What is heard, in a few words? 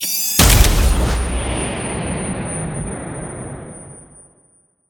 camera flash